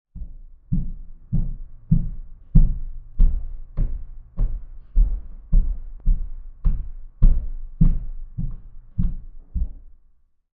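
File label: Footsteps Male Stomping, stairs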